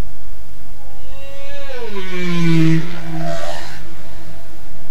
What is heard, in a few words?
whale